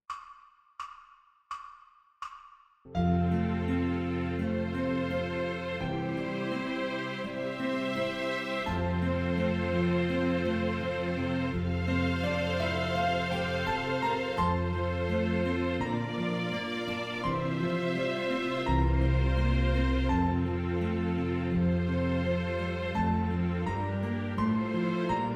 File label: Concert F Your C Pentascale